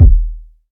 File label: small reverb bass drum single hit D# key; kick